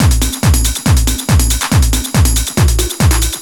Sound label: hard beats 140, hard trance beats